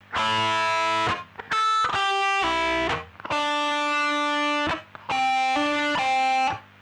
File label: riff